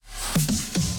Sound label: Music Laser Show START; barbie dreamhouse adventures